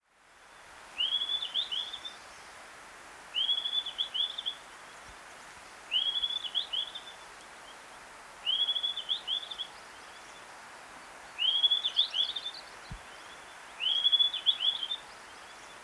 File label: White browed Bush Robin Tarsiger indicus, white browed bush robin call